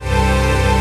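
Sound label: chord pad, Pads; Best Service; XX Large - Pads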